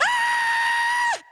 squeal

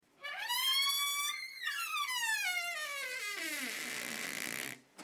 door open

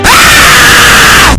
car jumpscare loud

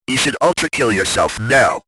anime girl noises; super top secret

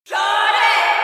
echo

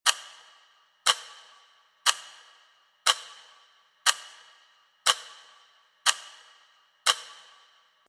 time stop ambience

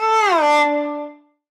cartoon orchestral

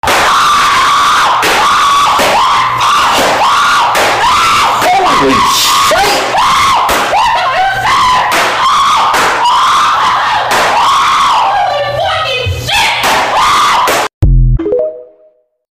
ahhhhh

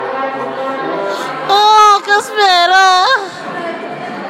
ahh; casemiro

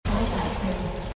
ping; callback cyn

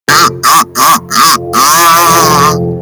laugh; donald dupe

